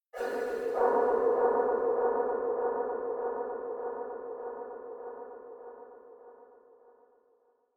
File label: Nightmare Soundscape